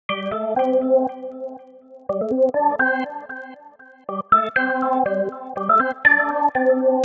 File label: organ